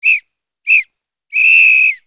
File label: whistle full time